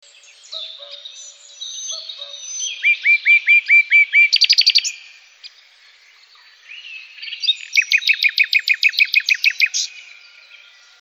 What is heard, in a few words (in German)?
Singvoegel Nachtigall